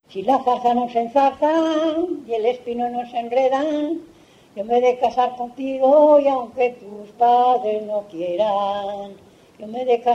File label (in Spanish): Jotas Olmedo